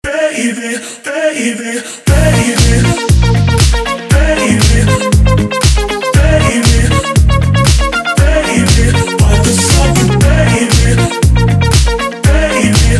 Отбивка

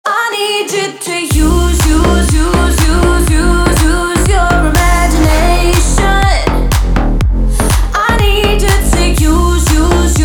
Отбивка

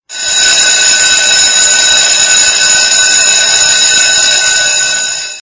просто звонок

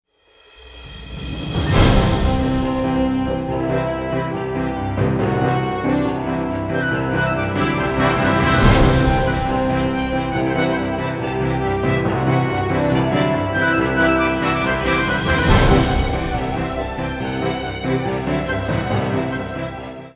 фанф

